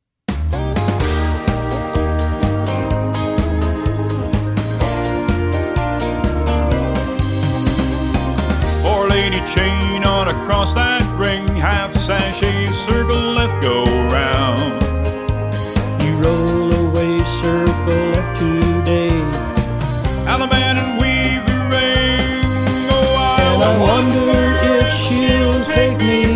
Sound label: vocal